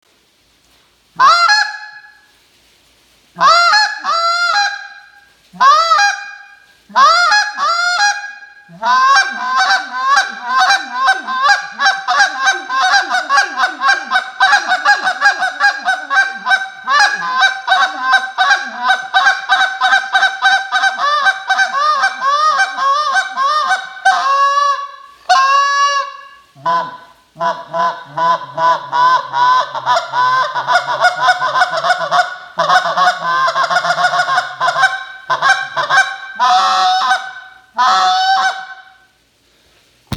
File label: Omega